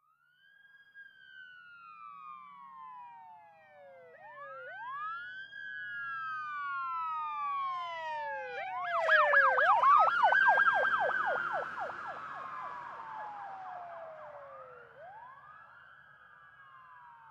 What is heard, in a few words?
Ambulance By Medium, ambulance